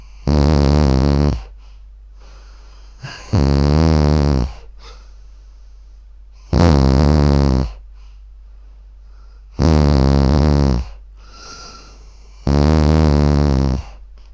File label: 5 years old boy, bedroom